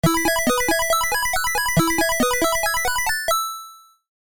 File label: Retro game arcade level complete you win; level up